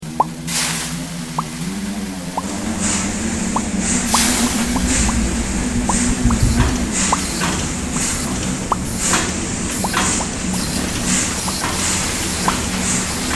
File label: Instruments